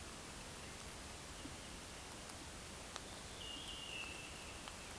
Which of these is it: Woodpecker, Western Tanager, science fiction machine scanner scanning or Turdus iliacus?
Turdus iliacus